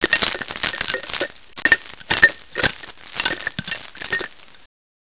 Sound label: glass